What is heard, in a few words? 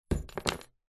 household padlock small set down on wood; zapsplat